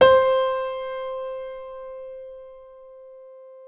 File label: PNO F; Synclavier Sampler Library